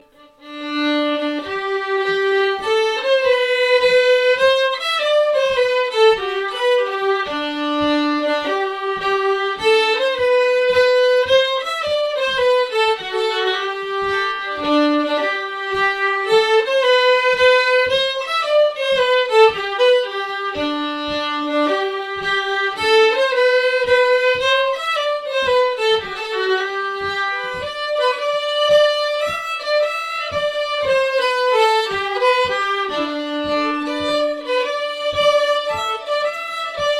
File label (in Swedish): Trollpolska från Alvdalen